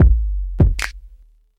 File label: Loop